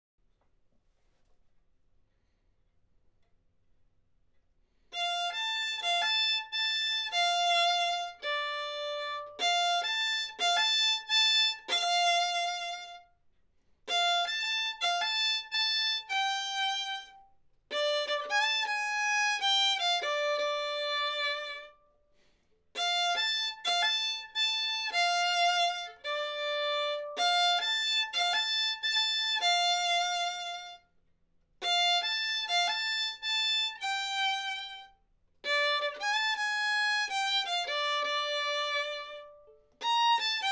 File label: violin